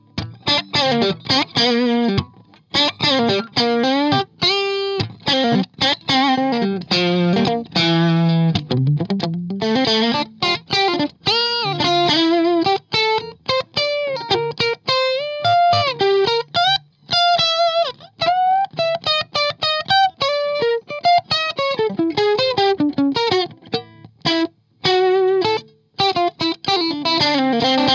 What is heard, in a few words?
ef86 strat middle; bad cat black cat